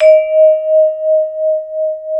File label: MLT VIBE